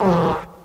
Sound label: fart short